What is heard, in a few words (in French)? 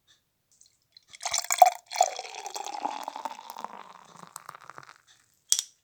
Coulis de biere verres emplissant, Bruitages Eaux